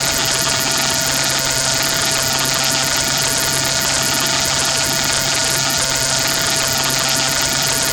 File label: mad radio, special FX twisted